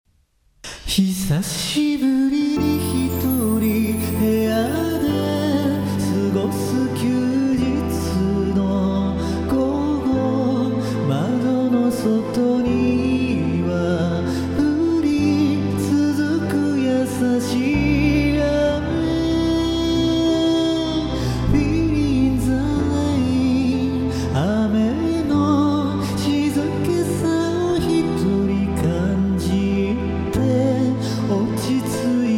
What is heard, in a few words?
bass